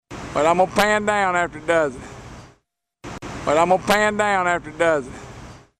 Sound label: Pan Down